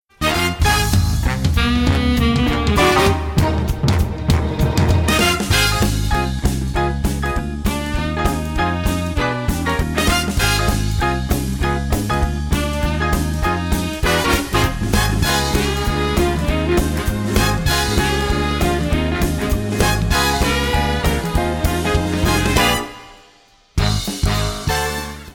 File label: Accompaniment